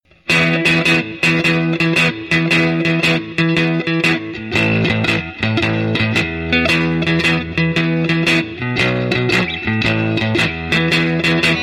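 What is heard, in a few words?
Lightning Middle Solid State Hot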